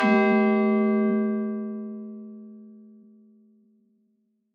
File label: bells of sussex; brighton old ninith